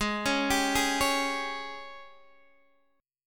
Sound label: G sharp Major 7th Suspended 4th, chord